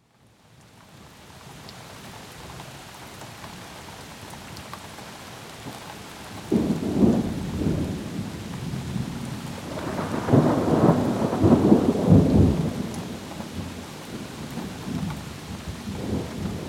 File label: thunder and rain